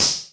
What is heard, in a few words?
shaker sound sample e key; percussion